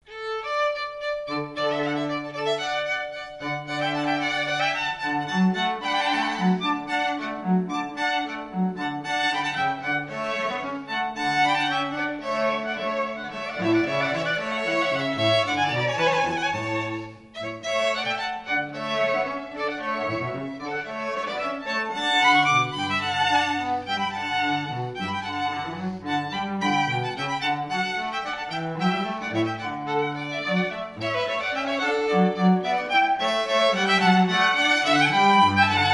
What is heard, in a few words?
strings royal fireworks; carnevalstrings